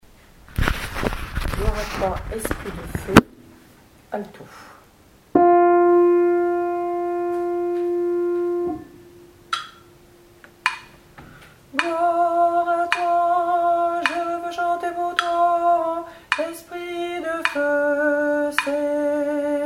ALTO; Gloire à toi Esprit de feu

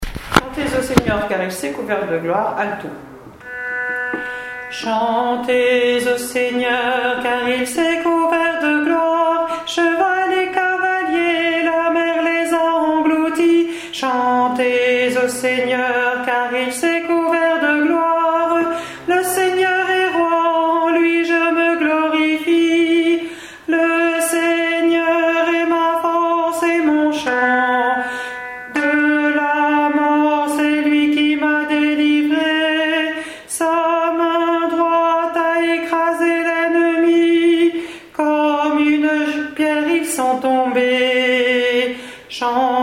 Cantique de Moïse Chantez au Seigneur car Il s'est couvert de gloire Alto; Chemin neuf

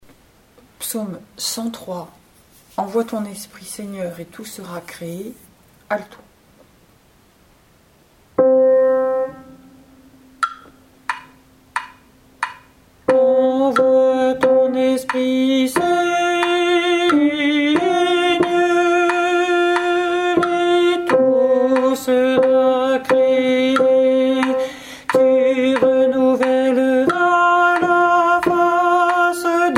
ALTO; Psaume 103 Envoie ton Esprit Seigneur et tout sera créé; Gouzes

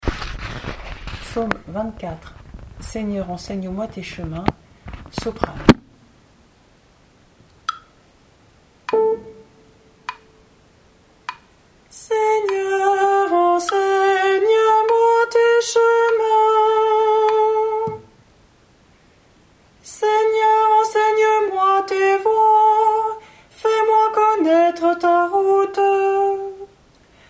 Psaume 24 Seigneur enseigne moi tes chemins SOPRANE

